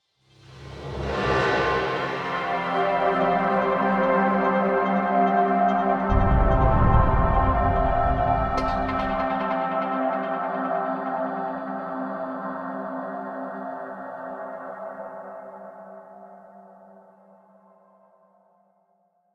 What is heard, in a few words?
Combat Dogfight; Elite Dangerous